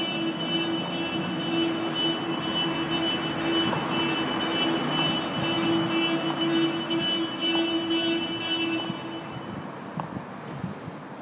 evening street ambience glasgow

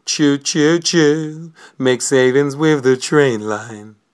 choo

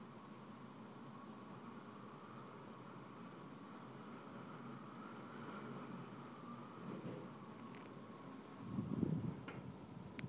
draughty office window

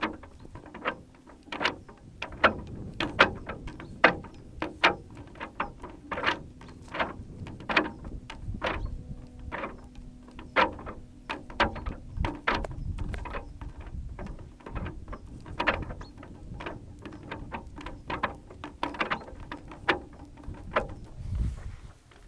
strange noises on salisbury plain

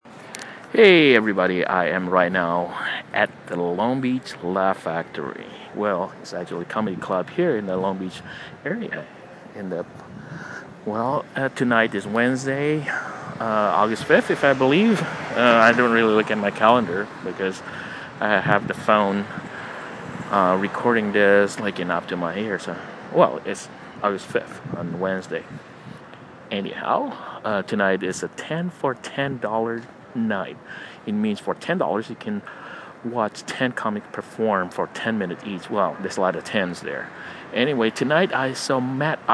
comedy night; long beach laugh factory; 10 for 10

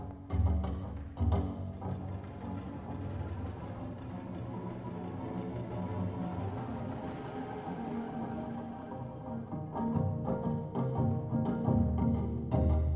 double bass concerto extract; fujikura

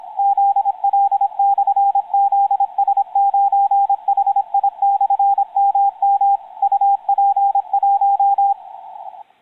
on 15m cw; zs9hi mm; heading towards heard island vk0ek

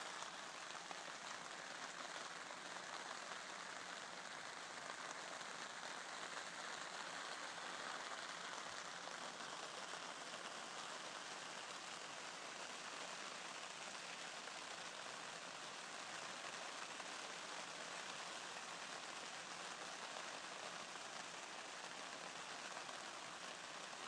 falling asleep in a tent under light rain

